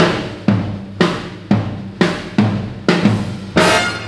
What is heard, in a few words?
fill